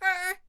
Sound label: Ice Archer Attack Vocals